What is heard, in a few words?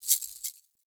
Rattle Medium; Encounters; Treasure Hunt; Banglenut